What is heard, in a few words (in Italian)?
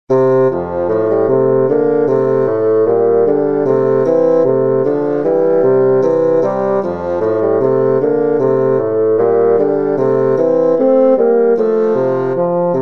Fagottino